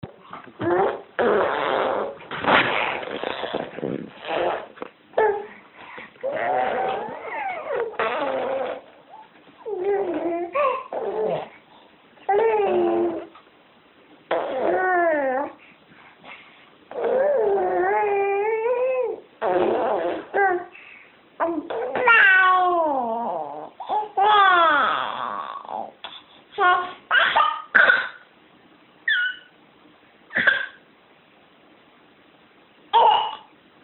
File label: Infants Babble Ringtone